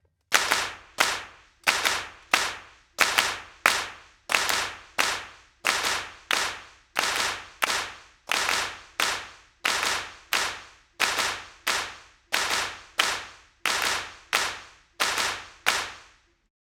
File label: CLAPS